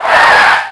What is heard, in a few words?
skid; auto; Tony Hawk's Project 8; XBOX